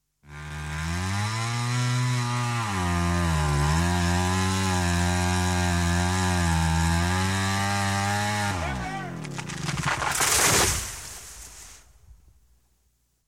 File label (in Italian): MOTOSEGA